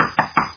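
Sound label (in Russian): звонкий стук по деревяшке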